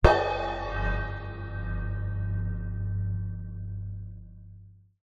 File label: musical cymbal crash tap med hard wobble hand knuckle; zapsplat